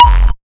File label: kikk, kick